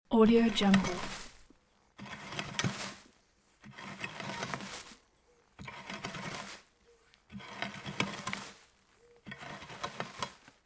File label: metal rakes cleaning grass; domestic sounds